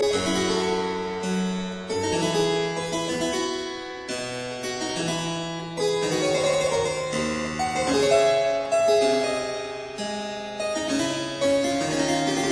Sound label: Keyboard Suite in D minor, Sarabande; Froberger; Keyboard Suite No 1 in D minor; Arthur Haas